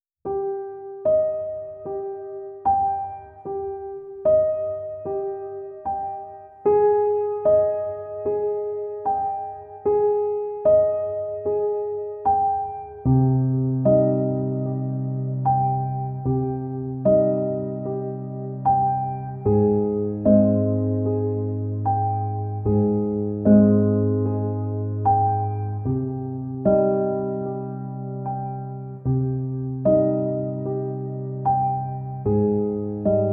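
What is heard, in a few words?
piano version; By Burak Sound Bank; dramatic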